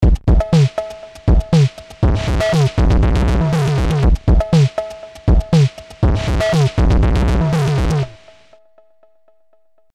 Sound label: CMI V Beats FILTER MS 20 Gabber Seq